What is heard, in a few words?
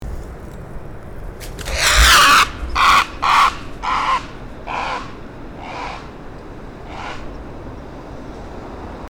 great blue heron call; chesapeakebay